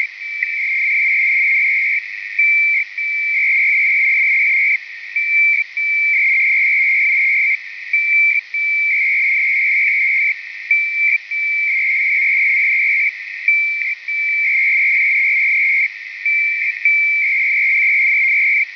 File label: HC-ARQ